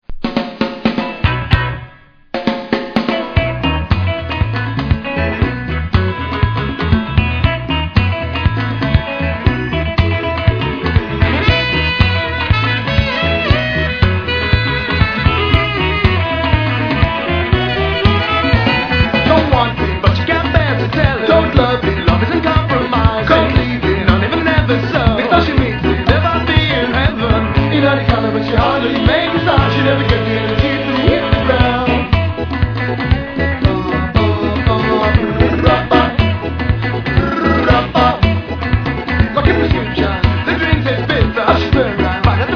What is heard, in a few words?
beat